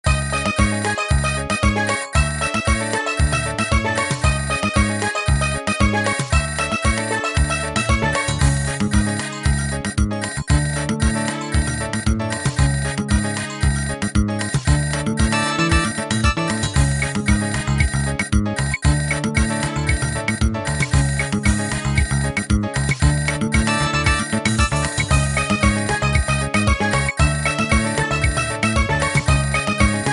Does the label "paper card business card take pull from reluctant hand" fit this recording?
No